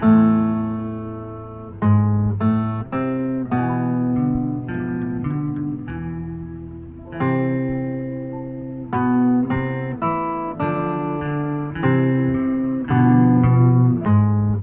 guitar, Loops, Sad

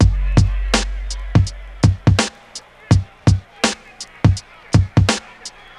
Drum loops, Boombap

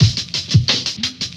ticks, drum loops, boombap

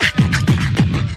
crunker, Beatbox, Drum loops

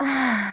female orgasm; ooh ahh

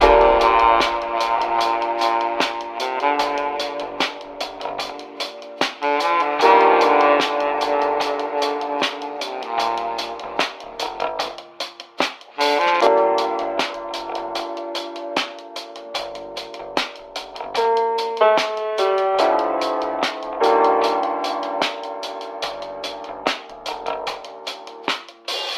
sax, Loops; Phonky

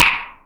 Clap n Snap, Snares

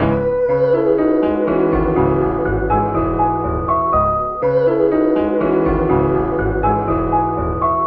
piano and voice, Loops Sad

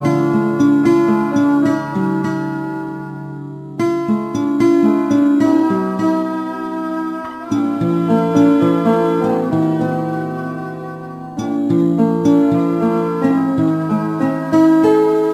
guitar, Sad

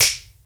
Clap n Snap, Snares

